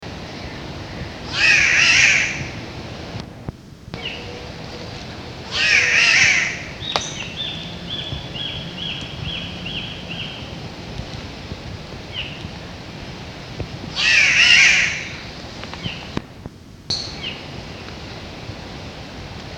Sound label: spotted catbird